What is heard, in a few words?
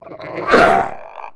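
two head attack; Star Wars Knights Of The Old Republic I; XBOX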